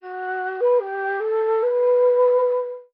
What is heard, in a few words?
flute traps and snares, Loops Sad